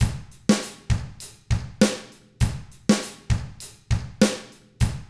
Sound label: ROCK, Drums; ILIO; Double Platinum Drums 1